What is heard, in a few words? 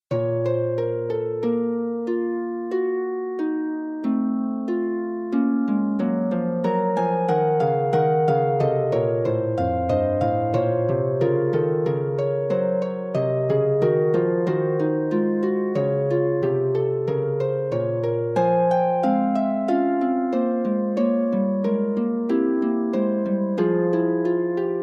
Prelude, harp; Forty Eight Preludes and Fugues Book 2